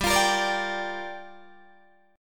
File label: G Minor 7th, Guitar, DADGAD